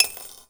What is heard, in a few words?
Coin Toss Into Tip Glass; Foley - Rattles